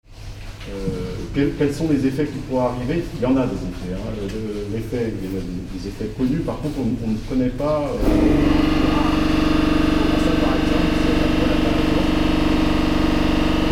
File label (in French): irruption masque labo